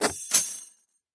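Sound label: sounds of chains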